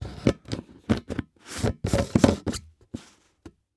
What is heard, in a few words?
Container Screw On Container Lid Fast; household